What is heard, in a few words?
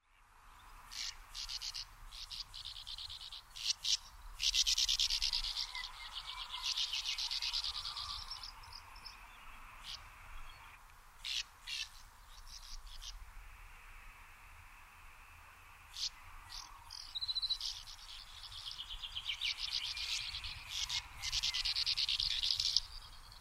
sand martin